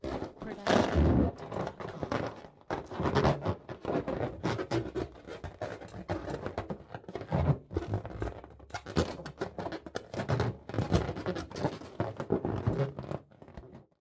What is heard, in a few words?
balloon rubbing extended